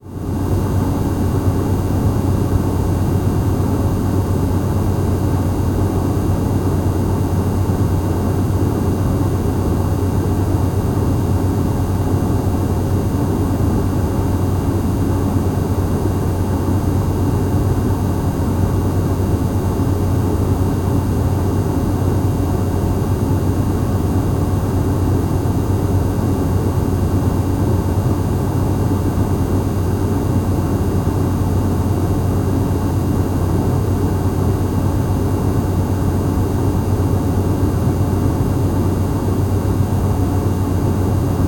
ストーブの音

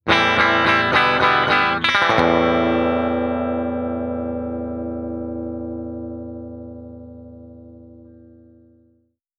ギタージングル

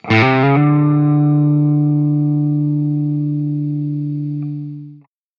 低音弦

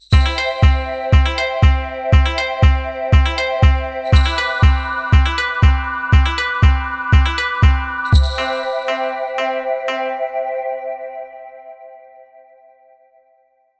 和ジングル ハウス

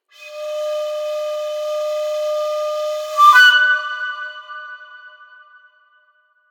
段々強く

